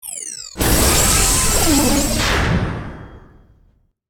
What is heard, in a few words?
Menu Scifi Interface Close; Menu FX